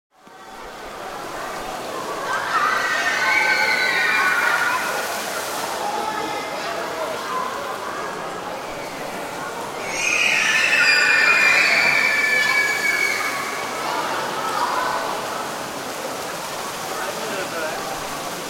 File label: Children screaming with excitement around a fountain in an indoor mall; Playing; Human; Kids In Fountain